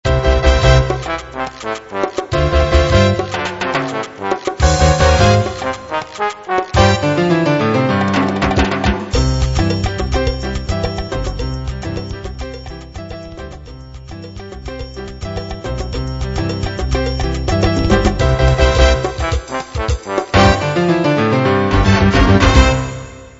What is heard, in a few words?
Jazz Mambo